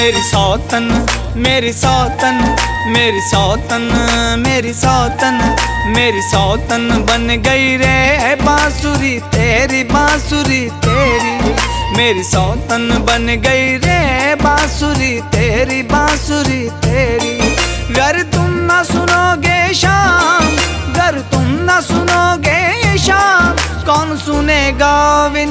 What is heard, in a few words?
Bansuri, ringtone; Krishna Ji